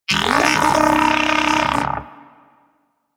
Moa Pet battle end; Warframe; Pets; Tenacious

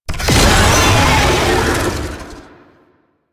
Lasrian Tank Infested Vocal Pain; Enemies; Barks; Warframe

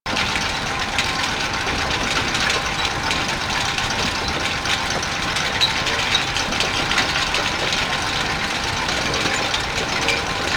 Elevator Pillar Loop A; Warframe; Ambience; Grineer; Grn Elevator Hell 1

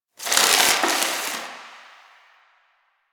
Box Of Trash Rustle

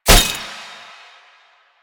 Weakpoint Impact; Enemies; Lasrian Tank; Warframe

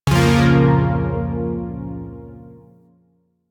Shawzin Hirajoshi Chord E; Music; Warframe; Zariman Shawzin

